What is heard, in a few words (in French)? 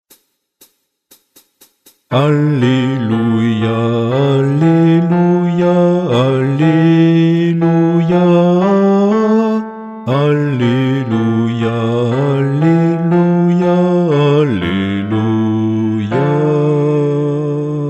Alleluia Guide Chant Basse